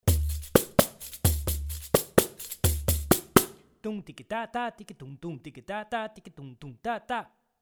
Outro, pandeiro

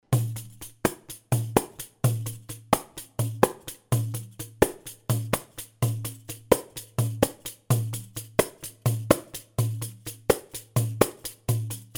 Dumdikitata Stimme 2, pandeiro

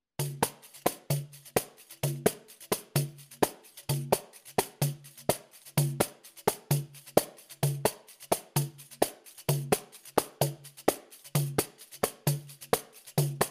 oriental, pandeiro

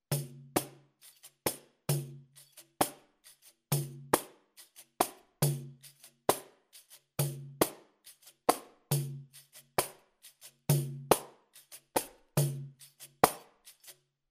oriental slow, pandeiro

